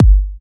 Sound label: Bassdrums; Vengeance Samples